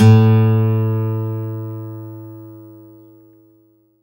HRD PICK; Quantum Leap; Guitar & Bass

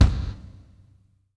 GATE, KICK DRUM; Sampleheads; New York City Drumworks VOL-1

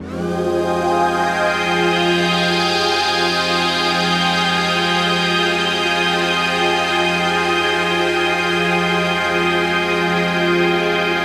pad slide F

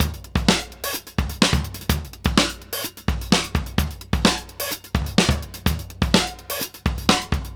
Funky 16 fx 127, Beat Loops; Zero-G; Monster Beats

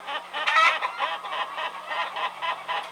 COOPED UP, ANIMAL FX; Greytsounds VOL-1

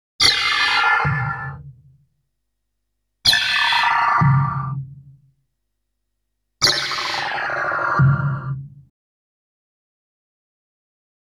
Gated Reverb Noises; Bizarre Planet

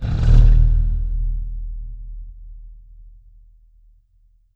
pipe rub; Q Up Arts; Denny Jaeger Private Collection

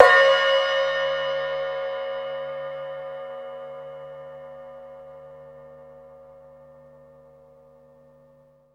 Mallet Hats; Roland L-CD701; Asian 2

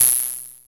FX Analog; Sound & Vision - Gigapack II

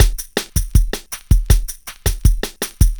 LOOP, Eurotech; Sounds Good

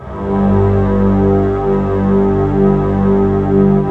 T-3; Rubber Chicken Software Sample Bank; KORG

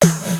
INDUSTRY HIT; Rubber Chicken Software Sample Bank; DRUMS + PERC

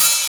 OPHAT; East West; Funky Ass Loops

